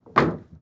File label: Place Wood; Myst III Exile; MALL